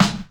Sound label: Nineties Strong Bottom End Hip-Hop Acoustic Snare Sound G Key